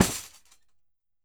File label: Roland MV8800 percussion